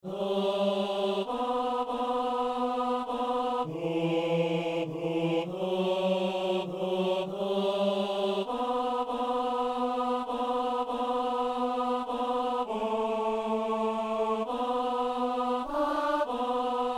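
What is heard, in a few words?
tenor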